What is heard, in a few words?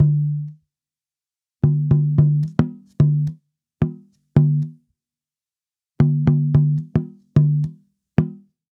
Tambora, African